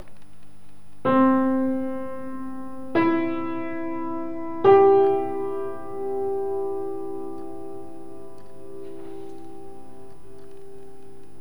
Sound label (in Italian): pianoforte